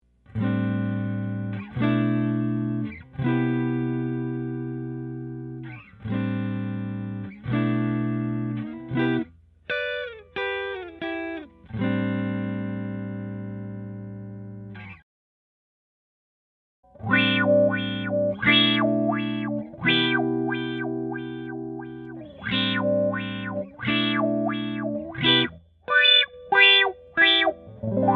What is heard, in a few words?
wah treble